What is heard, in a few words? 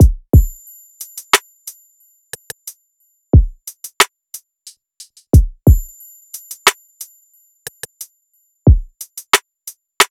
Drum Loop 90 BPM, RnB; Distraught; Cymatics; ANALOG EVOLUTION Beta